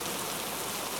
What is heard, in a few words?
rain, weather; ambient; minecraft; TBMPack